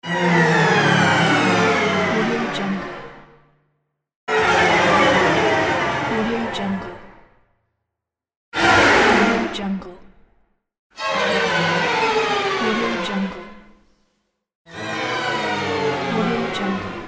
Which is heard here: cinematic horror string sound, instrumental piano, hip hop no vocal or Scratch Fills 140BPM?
cinematic horror string sound